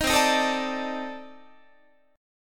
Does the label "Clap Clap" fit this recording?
No